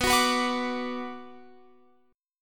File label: B Minor B Guitar Open F; strummed